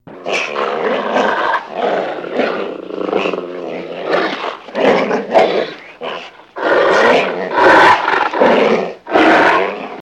Dinosaur Growls Grun

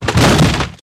Book Tumble Wood

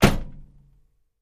Cadillac 1969 Door Slam, Interior

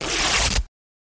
Cloth Rip Medium

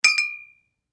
Bottles Clinking; eating & drinking

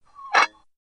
Squeak Tiny Screw, screws

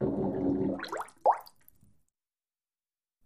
Bathtub Fart; farts

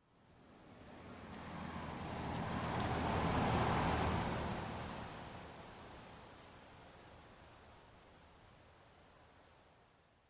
Car By Medium Speed

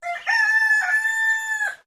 Rooster Crowing

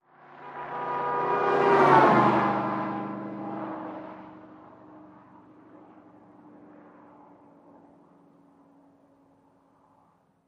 Cars Horn Fast Speed, horn

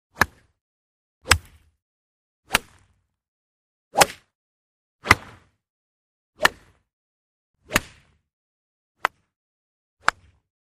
Golf Iron Drivers 9 x Iron

